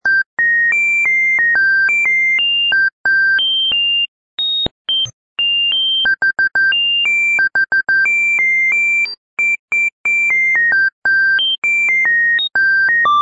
siemens; midi; Polyfonisk musik

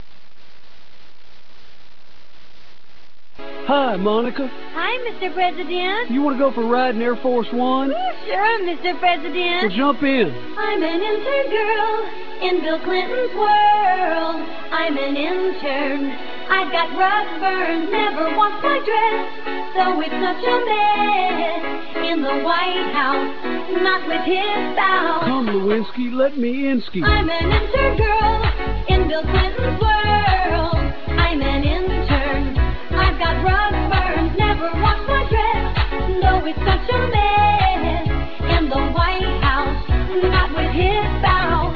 MIDI Ringtones; 500 Mobile MIDI Ringtones Part 5

